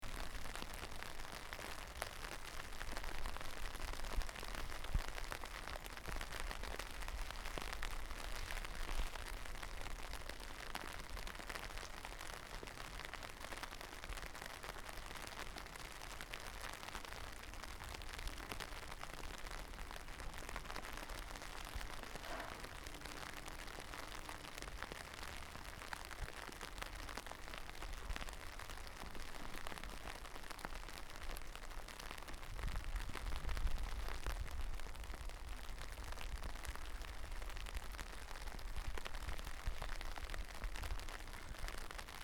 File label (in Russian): kapli dojdia po zont; шумом дождя, падающих капель